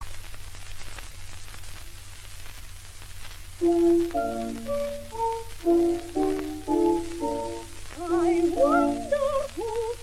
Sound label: Cuckoo Music